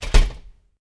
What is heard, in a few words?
Door Push Bar Door Cl